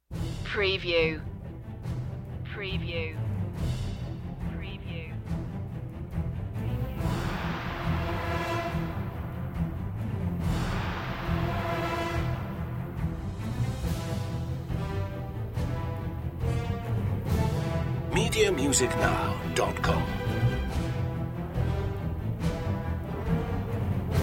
no solo vocal

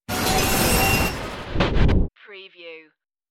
stargate dimension opening

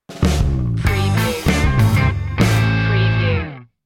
rock sitcom ident

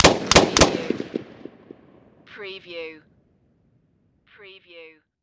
Machine Gun M16

